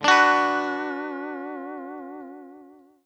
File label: Riff, funk